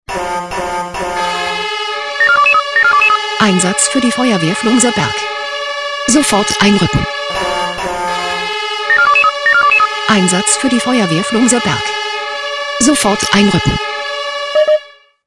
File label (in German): SMS Alarmierungstone; FW Flumserberg